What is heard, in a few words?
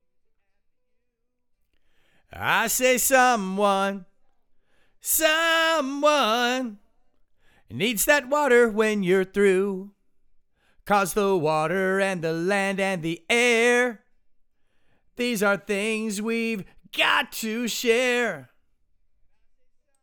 vocals